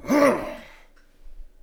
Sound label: claw miss